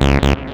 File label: dance music bass